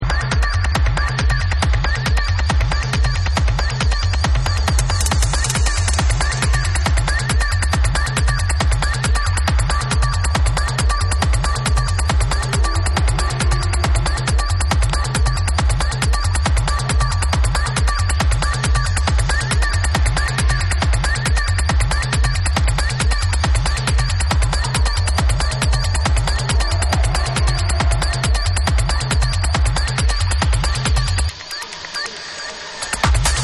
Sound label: LEAKY FAUCET